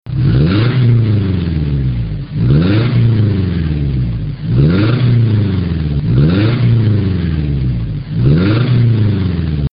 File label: Tacoma 2.3L Single